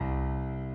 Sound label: key; keySounds